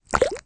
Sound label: Bubble Gum Burst